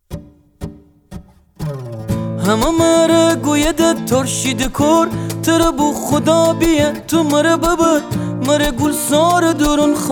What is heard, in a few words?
Version Guitar